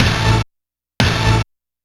8bit Hit; Sonic Foundry (Sony Creative Software); Dr. Fink's Funk Factory; Orchestral Hits

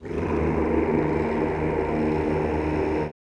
CHO Rrrr; Sound & Vision; Gigapack I CD 2; CHO Mmhh + Rrrr

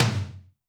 tom, Zoom MRT-3B

